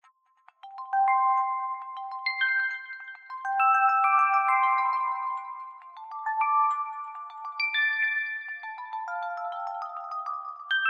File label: Raindrops, ringtones; sky ringtone